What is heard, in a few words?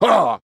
Worm Attack; Col Worm Attack